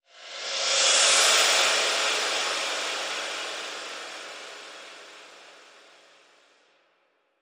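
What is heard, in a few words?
Whoosh Mysterious Swell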